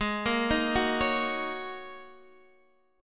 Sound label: G sharp 7th Suspended 2nd Suspended 4th, Guitar, Open D; x,11,8,9,9,11; down; chord shape